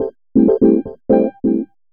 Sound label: HOUSE EXTRA, 125